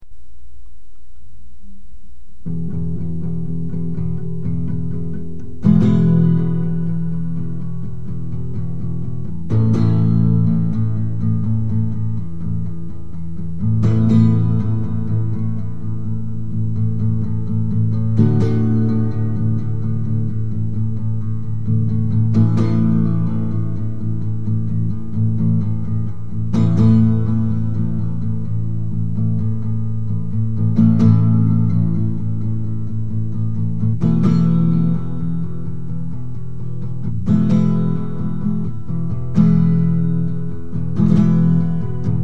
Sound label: folk overure